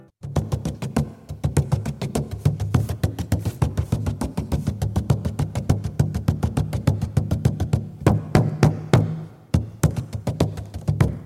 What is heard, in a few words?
Instrument